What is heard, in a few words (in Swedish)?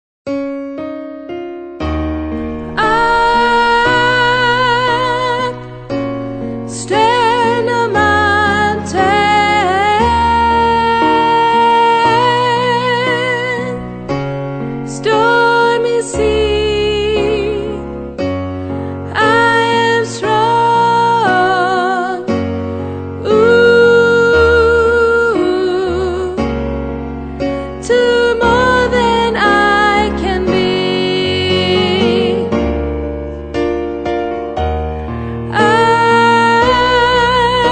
alt, stammor